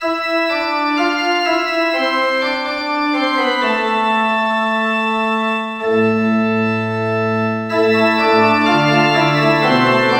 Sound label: church organ